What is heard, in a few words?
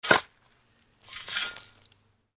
shovel